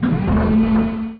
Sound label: Foot Fall Ext